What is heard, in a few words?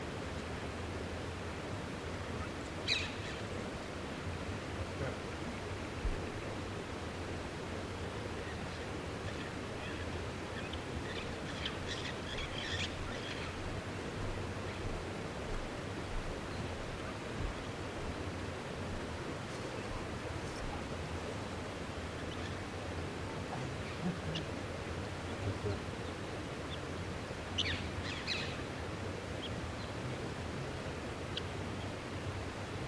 flame winged parakeet